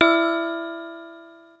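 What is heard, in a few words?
clock chime; BEAT2000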